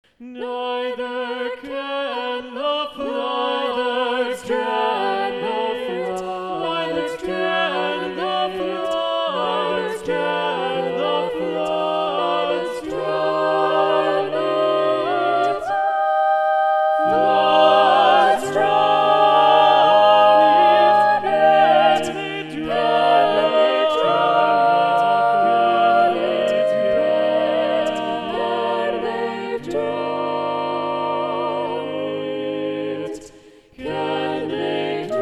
Set Me as a Seal SATB Balanced Voices

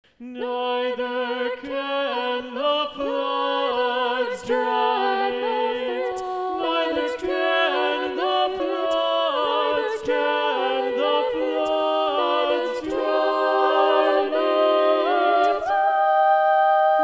SATB Bass 2b Muted

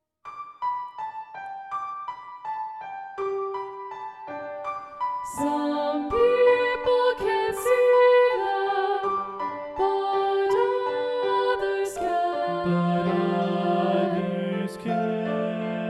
SATB Balanced Voices

